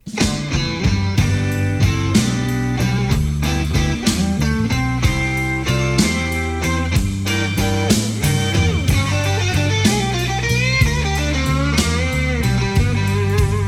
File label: intro in G